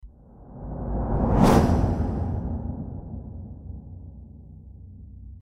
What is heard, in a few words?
deep and cinematic woosh sound effect